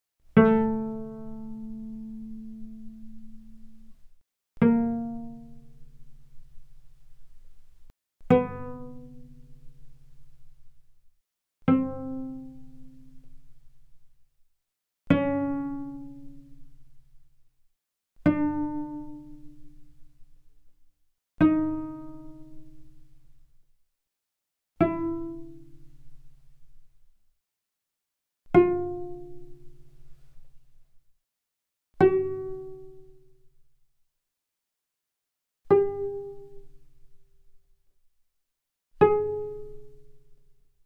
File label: Cello pizz ff sul A A3 Ab4; Strings